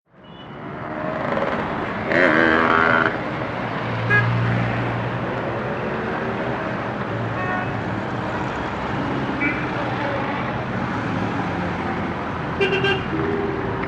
Iran City Traffic

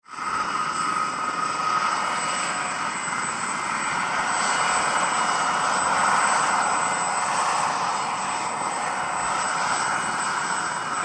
Gazelle Idl Awy Lng Id, aerospatiale

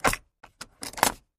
Latch Open, trunks

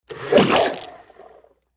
Blender Pulse Lid Pop

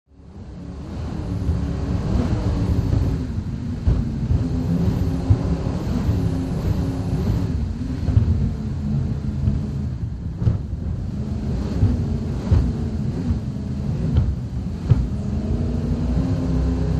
Power Boat Int Racin, off shore power boat

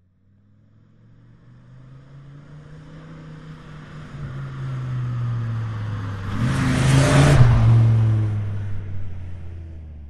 Dodge Ram: Fast By With Rev.

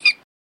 Mink Shrt Blast

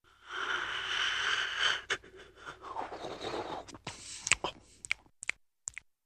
Big Quiet Yawn Lip Sm

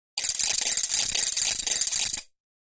Mill Clack Wooden Rol, percussion shaker

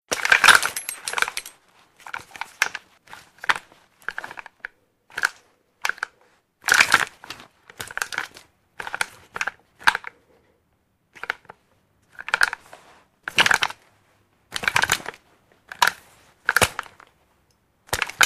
Gun Handling Light, handgun mvmt

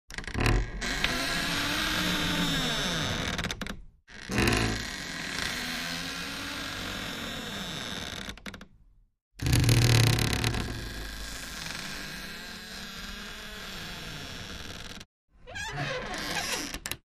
Door Wardrobe Creak Double; hinges & creaks; Door Wardrobe Creak Double x4

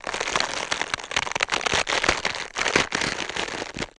Cabbage Rub Crackle; gore stabs & eat

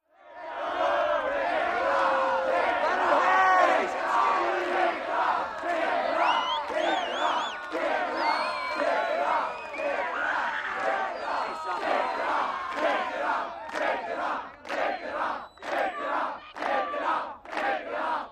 Catcalls From Rowdy

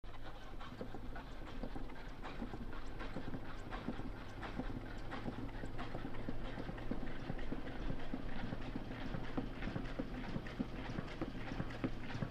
Car Tire Flat; By Medium Slow With Light Motor

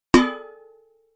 Impact Metal Bucket; barrels buckets & cans

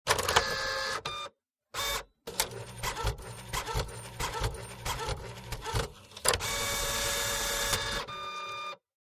Computer Printer Ink; fax scanner copier

